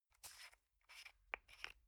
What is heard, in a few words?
toothpaste screw cup open soft